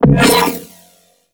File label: Shield Aura Attach Player; Warframe; Iron